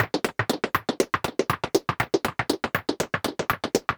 Modular Noise Rhythm (120BPM)

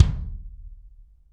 BD AMBIENT, Drums; Real Mega Drums

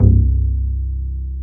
STR Cb Pizzicato; Roland L-CD702

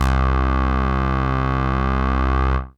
Synth C, Synth Prophet One Shots; Electronisounds

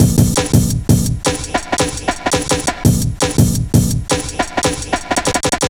Old School, Jungle, Drum Loops 168.5

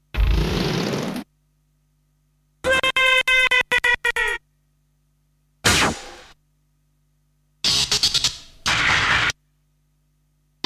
Sound Effects; Kniteforce; 1000 Samples Volume 1

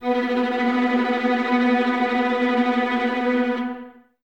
VLS B Tremolo, Violas, String Ensembles; Miroslav Vitous

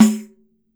PANDEIRO, Brazil Drum Perc; E-MU Producer Series Vol. 7 – Old World Instruments

